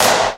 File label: 1990s piercing snare drum sample a key; Duration 0:01; Samplerate 44100 Hz; Channels Mono